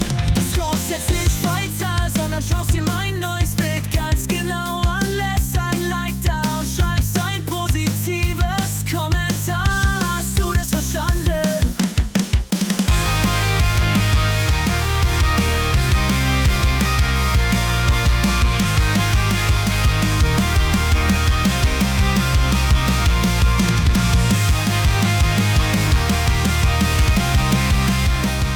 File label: Pop Punk Version